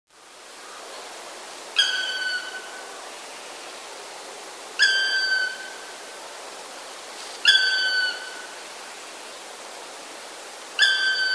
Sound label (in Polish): czarny, dzięcioła czarnego; Oto głos prawdziwego dzięcioła czarnego